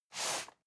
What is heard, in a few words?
cardboard box medium slide short; objekte